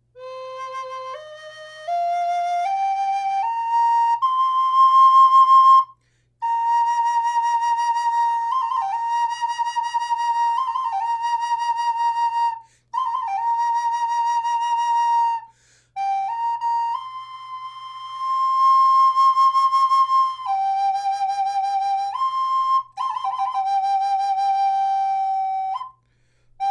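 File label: natural, wood flute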